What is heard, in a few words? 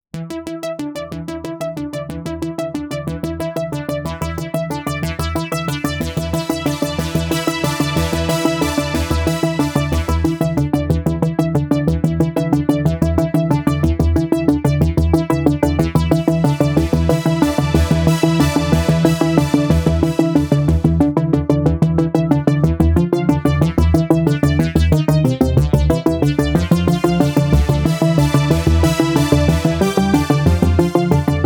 Spooky Arpeggios 80s synth; CUES for film TV games etc